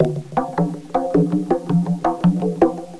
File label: jungle; on-u